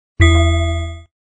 Chime Close; Kyocera; KX2 Koi; flip

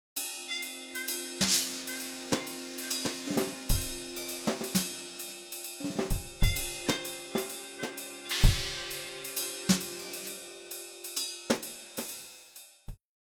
Jazz background music; Nokia; audio themes

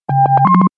beaming end

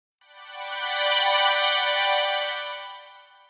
Layer Alert

